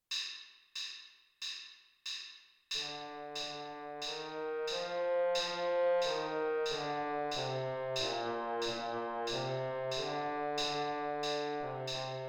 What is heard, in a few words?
trombone